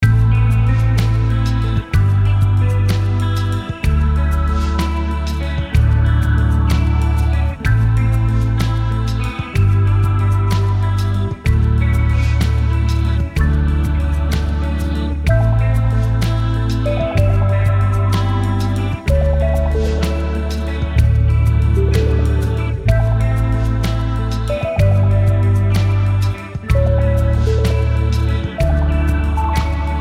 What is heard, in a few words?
Dropsy; Noisebiscuits